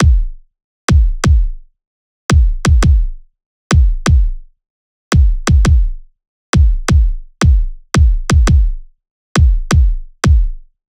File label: loop kick 170; juno